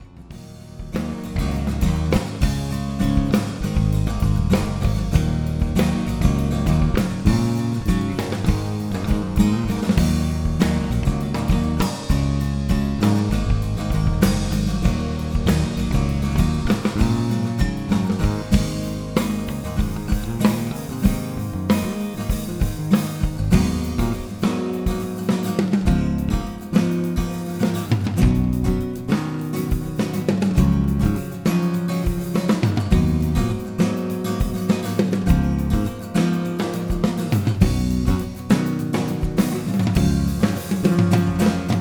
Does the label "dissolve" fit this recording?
No